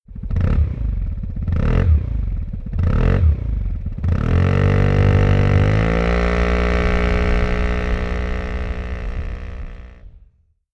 piaggio vespa gts 300 eu5 remus double mesh mit soundeinsatz